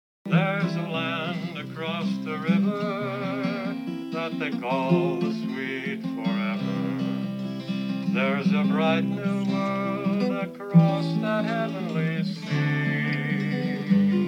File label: golden bells